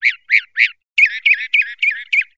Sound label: Bird Call